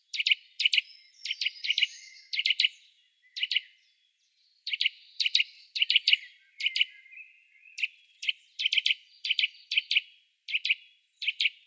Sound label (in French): Sittelle torchepot Cri d'appel